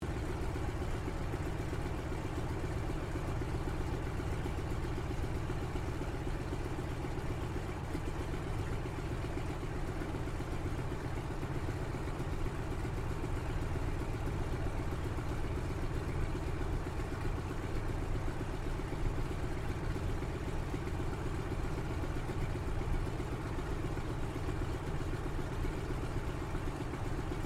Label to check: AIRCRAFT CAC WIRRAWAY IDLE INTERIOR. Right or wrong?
Right